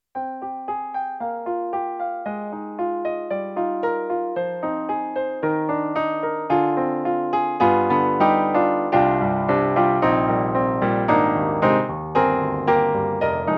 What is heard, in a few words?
YAMAHA MOTIF-RACK ES, Old Blues